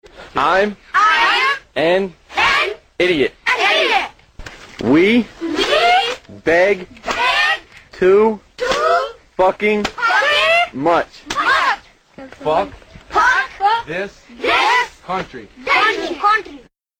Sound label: AFGHAN CHOIR